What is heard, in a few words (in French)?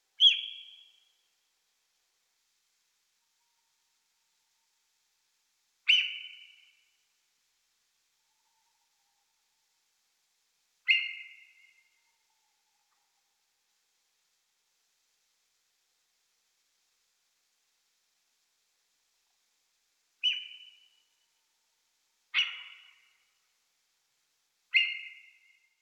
Muscardin cris